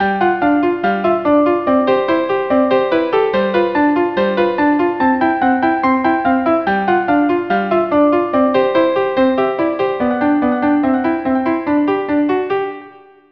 Galop violin duo

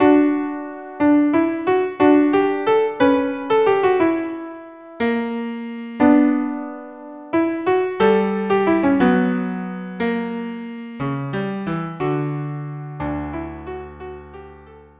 viola cello; Rossini; 5 duos

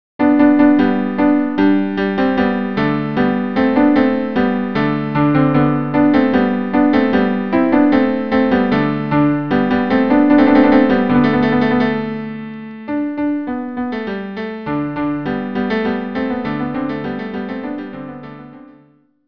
cello cello

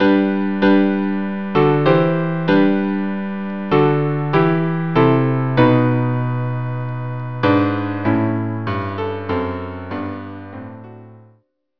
trio

